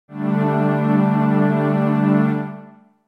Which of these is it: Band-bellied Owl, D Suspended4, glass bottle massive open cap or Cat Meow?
D Suspended4